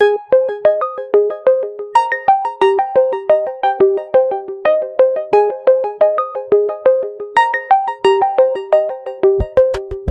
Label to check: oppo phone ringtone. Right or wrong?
Right